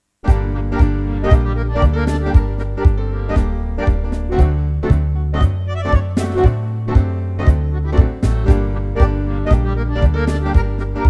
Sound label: Tango Jam